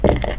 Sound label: money